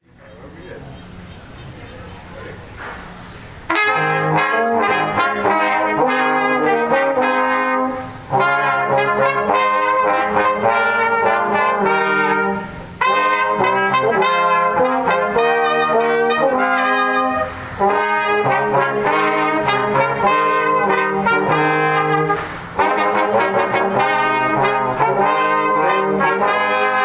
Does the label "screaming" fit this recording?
No